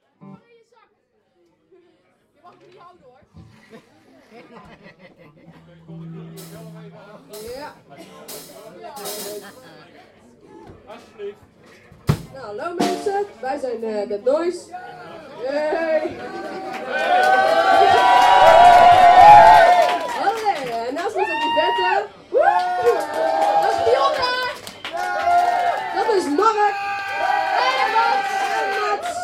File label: Death Noise